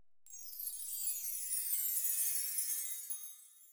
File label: windchime